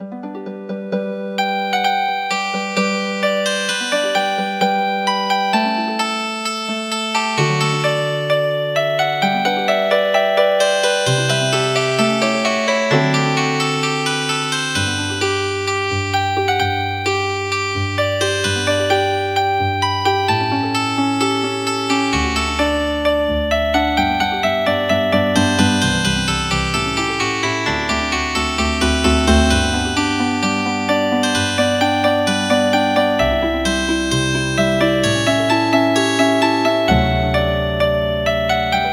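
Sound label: Hornpipe Healing Harp; midi; traditional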